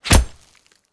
knife hit wall; czero opforce

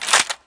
m95 deploy; czero opforce

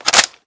famas clip in; czero opforce

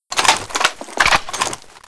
saw reload; csof

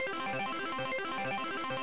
slots, casino; kopovcasino

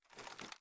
inspect, mp7

